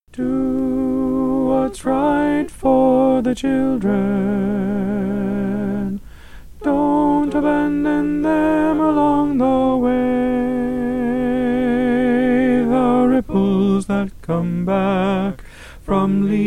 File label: tenor